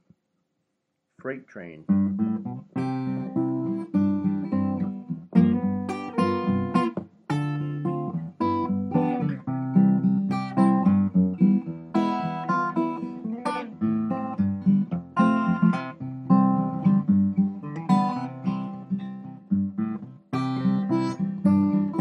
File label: freight train